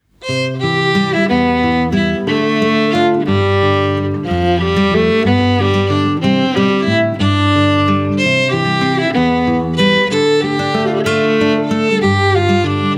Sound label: Waltz